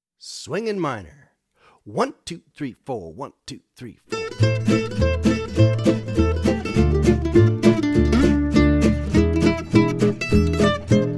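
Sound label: mandolin solo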